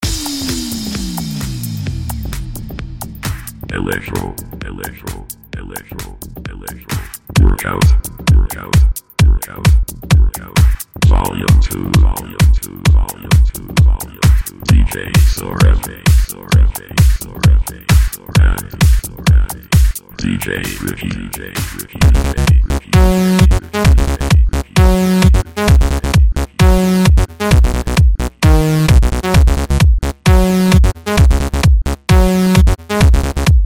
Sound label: Theme Electro Workout; UK Punjabi